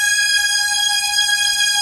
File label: SYN Disco-Stri; Best Service Gigapack 1